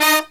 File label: HIGH HIT, Brass Riffs